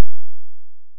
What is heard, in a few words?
exponential, synthesizer; lange-nacht-2009